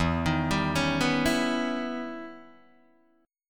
E Minor Major 13th E Guitar DADGAD; EmM13; arpeggiated; 2,6,5,6,2,2

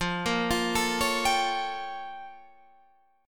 Bb Minor Major 13th

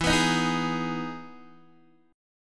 E Major 7th E down Guitar DADGAD

